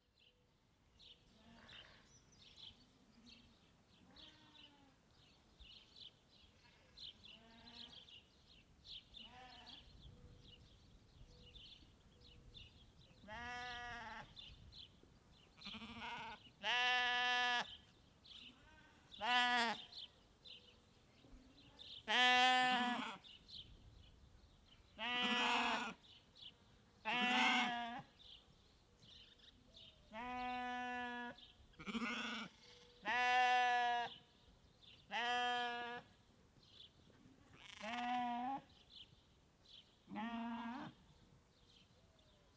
montana ranch with sheeps

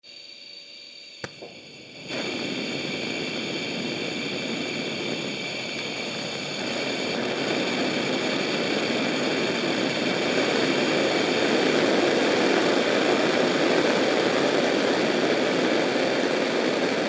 blowlamp

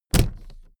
suitcase put down hard

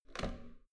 metal door heavy handle up

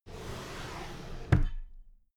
drawer cabinet paper close regular

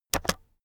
keyboard external space press fast heavy

